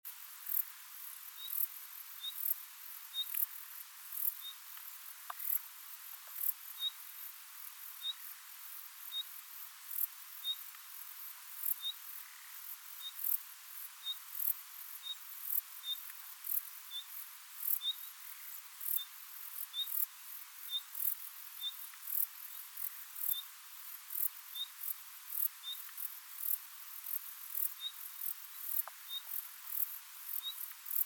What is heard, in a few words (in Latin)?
Luscinia megarhynchos; SETE